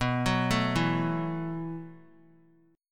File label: B 7th Suspended 4th B down; B7sus4; arpeggiated; JGuitar